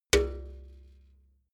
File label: metal bucket hit hard